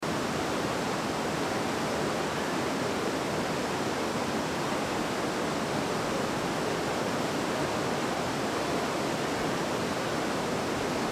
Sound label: river